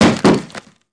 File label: plane hit; PC Gamer IT CD 47; USAF; USAFDEMO